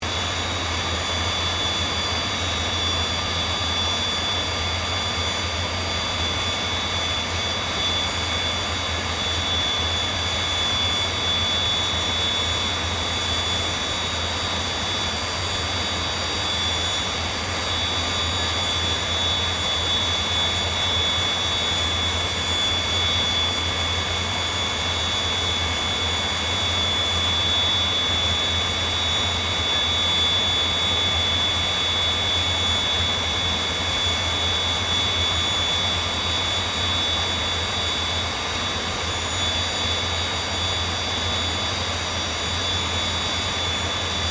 AIRCRAFT CA-27 SABRE IDLE; CA-27/F-86 Sabre; Airplanes (Sound Effects:Vehicles)

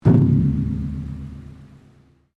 METAL IMPACT BONG; Materials

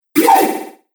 Tumbling Whoosh

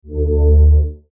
Logo Low Pulse; Sonic Logo Sound Effects; Production Element Imaging Element Accent Transition

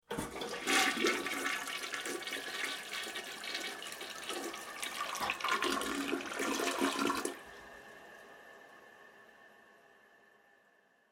Household Toilet Flush; Bathroom Sound Effects; Bathroom (Sound Effects:Household); SFX Bible 6

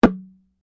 Bongo High Strike, Small wodden bongo set; Drums; Musical

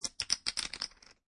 Poker Chips Stacking

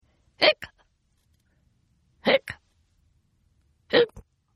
Hiccup Female; Hiccup Female 3; Hiccupping Sound Effects; Human

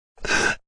Man Gasp; A man gasps.; Gasping

